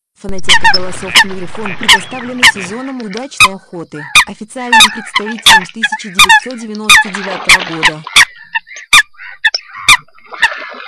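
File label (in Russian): Лысуха, манок; plurifon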